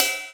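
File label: Cymbal 18; Drums; Ableton Foundation FreePacks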